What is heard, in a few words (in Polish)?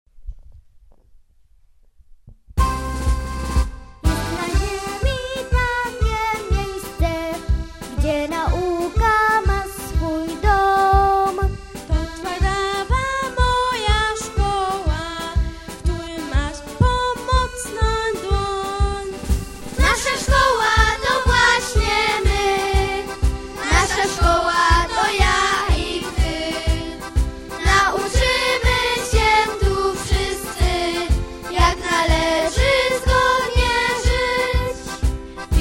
Hymn; SP Twardawa